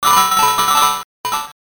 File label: coin shower